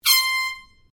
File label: AIRHORN SMALL BLAST SHORT, Compressed air horn; Sports